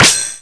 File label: Spark Bounce; A spark bouncing off a platform; zelda